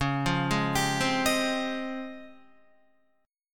Db Major Sharp 11th, Guitar; arpeggiated

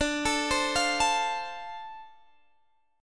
D-Minor 7th D, chord; Dm7 arpeggiated; x,17,19,17,18,17

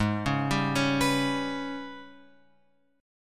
G sharp Minor Major 7th Double Flat 5th; G#mM7bb5 Chord {4 4 5 6 X 7} arpeggiated

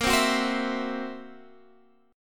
Fsharp 7th Flat 5th, guitar chord

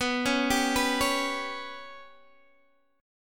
B Suspended 2nd Sharp 5th B, Guitar, Open D

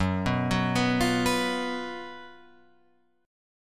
Gb Major 7th Suspended 4th Gb Guitar Open F; GbM7sus4; arpeggiated; 6,6,6,6,8,6; JGuitar

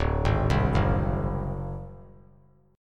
D 7th Flat 5th D down, chord; D7b5 arpeggiated; JGuitar

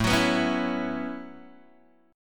A 6th Add 9th, Guitar, Open G

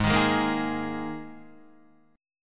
Ab Suspended 2nd Guitar; strummed; JGuitar

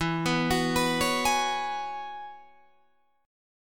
E 6th Add 9th E down Guitar Drop D

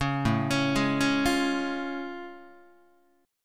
A Augmented Major 7th A, Guitar; arpeggiated; JGuitar

